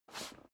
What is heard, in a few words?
step boots metal dry slide short; schritte; Footsteps